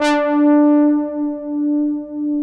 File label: OBERHEIMS